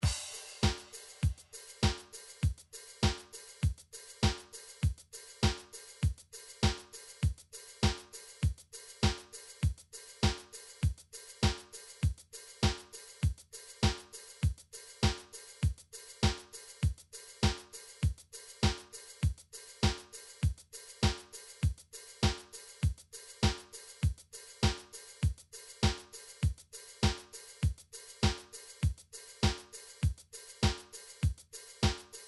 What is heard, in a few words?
midi drum patterns drums disco